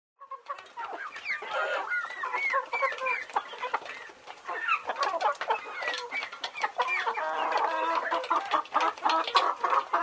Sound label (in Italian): uccelli birds, animali